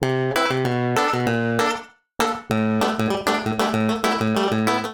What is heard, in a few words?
Fuzz Clav A Minor 97 bpm; MIDI Clips; Tonal; Accompaniment; Ableton; Factory Packs; Chop and Swing